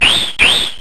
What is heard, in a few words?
Taunt; Pokemon Reborn